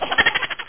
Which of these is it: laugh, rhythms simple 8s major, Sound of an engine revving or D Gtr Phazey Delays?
laugh